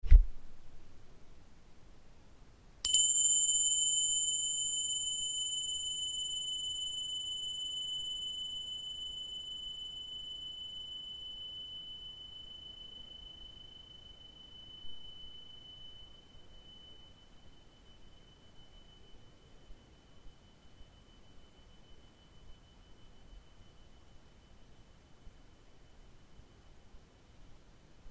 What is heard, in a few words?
Bells; Oneness Peace Alignment to Source